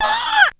VOX; Zero G; Man Machine

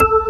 f#5-c#6, Organ; BeeThree

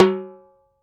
H-TIMBA OP, TIMBALES

